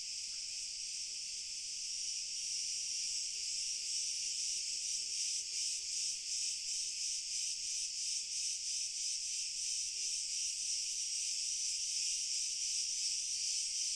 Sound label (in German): Grillen